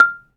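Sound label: MARIMBA G5; ILIO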